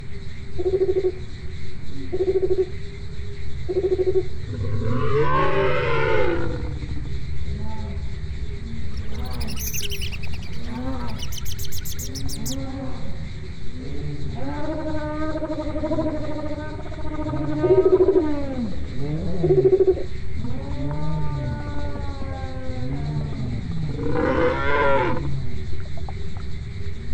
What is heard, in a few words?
Swamp